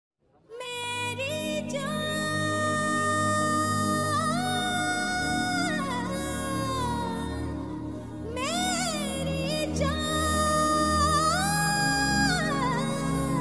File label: background music; ekkadiki movie